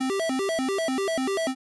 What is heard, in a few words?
ring